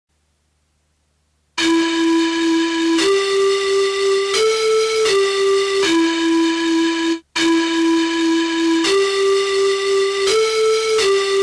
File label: Chinese Melody